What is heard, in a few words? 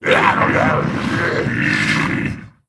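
iron giant hit; sf effects; sinfar haks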